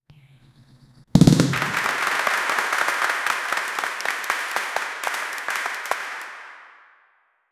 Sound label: zoom million dollar, game show